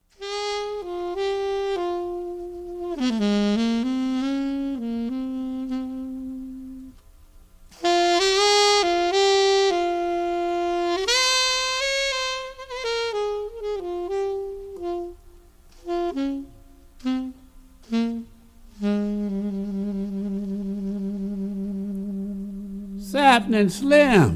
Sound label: drum